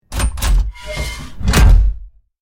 Mechanical metallic sequence gears movement

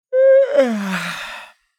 Man yawning large and exaggerated

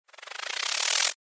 Bar chart expand animation infographics

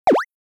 Cartoon designed boing

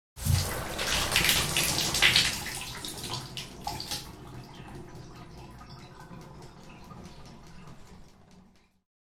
Shower turn off and water drip

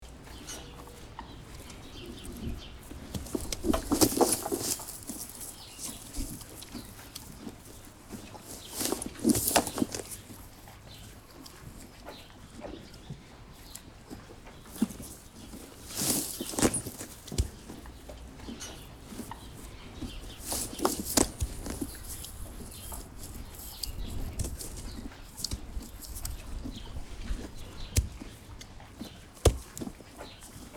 Quiet farm stable ambience loop

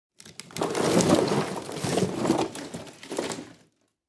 Wood pile movement

